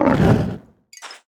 deathwood death; sfx

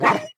bane wolf death; sfx

